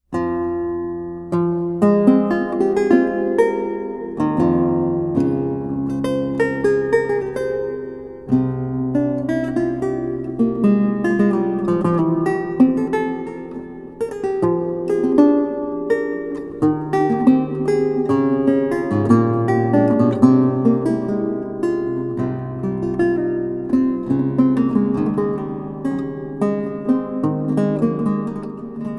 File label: Prelude, lute